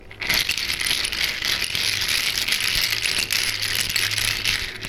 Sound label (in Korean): 오돌토돌